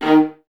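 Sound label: violas D#3 staccato, String Ensembles; Miroslav Vitous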